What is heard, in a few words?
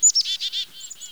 coast bird